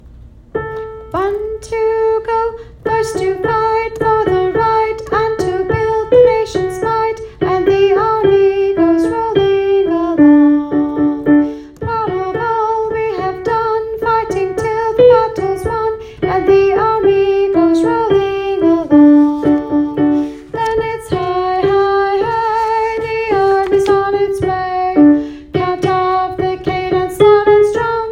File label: Army alto, Military Songs, Chorus